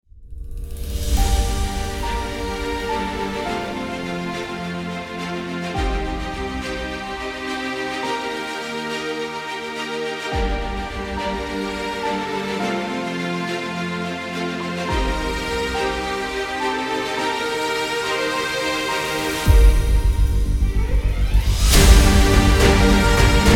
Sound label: Victory